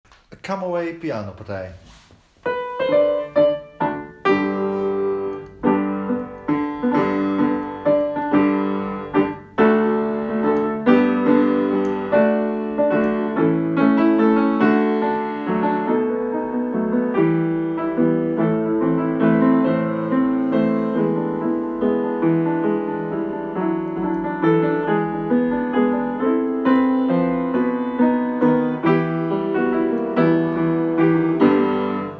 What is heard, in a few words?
PIANO